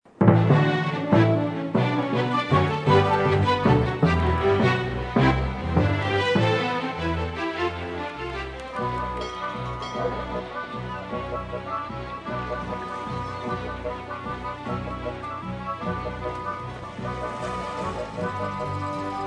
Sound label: Swordfighting; Monkey Island 3